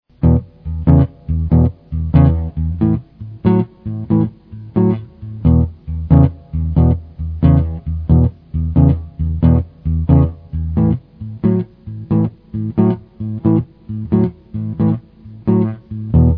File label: blues guitar